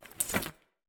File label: Victory Foley; portal quest; android; Antihero